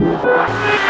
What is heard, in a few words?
Fx Vox; Equipped Music; Loungin House